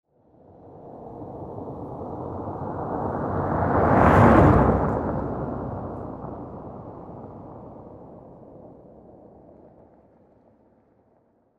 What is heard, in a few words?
Car Drive By Dirt Road; Cars